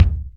DM5 Kick, Alesis DM5 drum machine

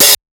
Hat Open, dx

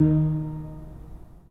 Pipe Mix Celeste, 3D Organs; E-MU Producer Series Vol. 5; 3-D Audio Collection

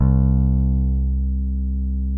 P BASS SUSTN MP C2; Sampleheads; Will Lee Bass Library

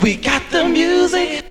VOX SUNG; AMG Megabass REMIX! VOL-2

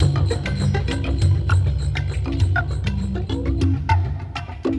Ambi Groove

